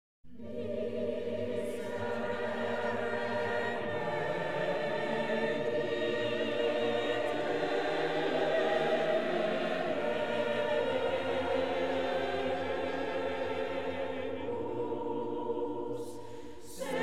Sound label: choir; handel; allegri